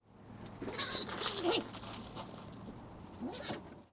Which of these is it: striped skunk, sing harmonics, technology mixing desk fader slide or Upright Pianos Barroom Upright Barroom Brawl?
striped skunk